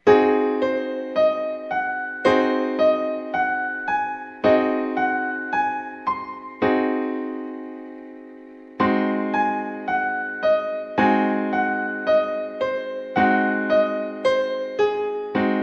Ab7 Gis7 Piano Chord

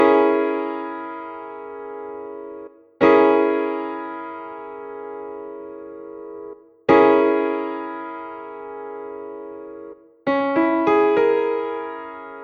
Dbm6 Piano Chord

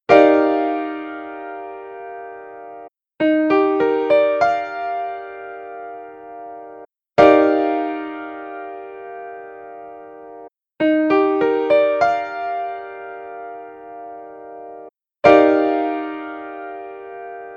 Ebmaj9 Piano chord

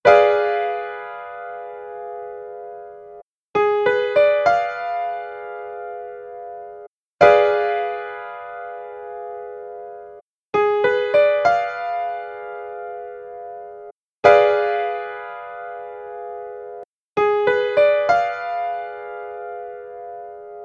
Gisdim7 Piano Chord

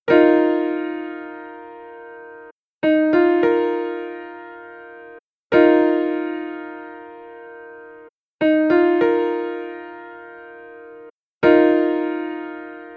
Ebsus2 Piano chord

